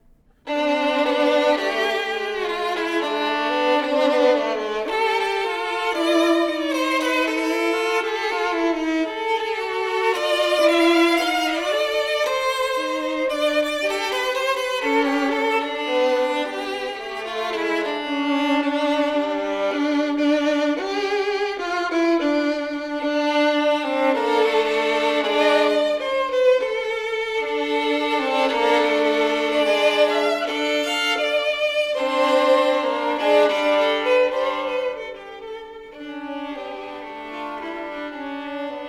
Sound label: Duos for Two Violins, Hungarian March